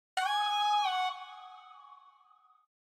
fat beat sound

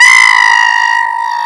meowoooooooooooooooooo

